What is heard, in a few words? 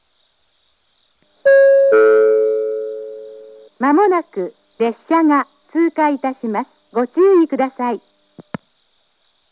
echigo kawaguchi pass, station; jr-east